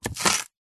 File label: spring onion single chop with knife